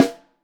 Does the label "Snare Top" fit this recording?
Yes